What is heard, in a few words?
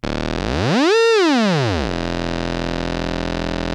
ARP 2600 E2, synthesizer; Hollywood Edge - Giorgio Moroder Rare Synthesizer Collection